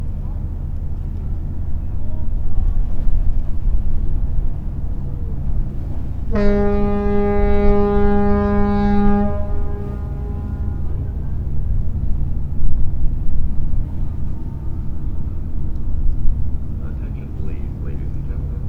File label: ship whistle